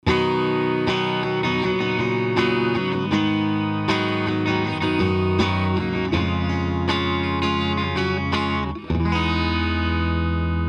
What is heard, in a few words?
Bi-Comprossor; AnalogMan; doppler